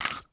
usp silencer screw, weapons; cstrike

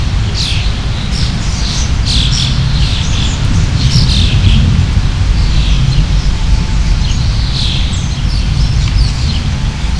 de desco ambience; cstrike

